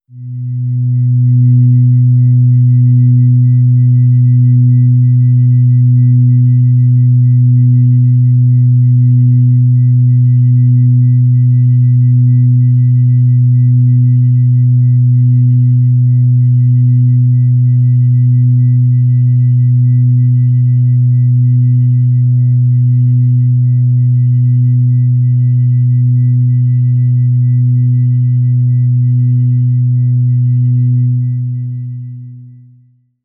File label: organ tone b